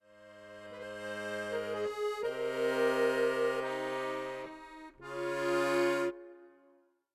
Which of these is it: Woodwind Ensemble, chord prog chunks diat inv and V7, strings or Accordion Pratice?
Accordion Pratice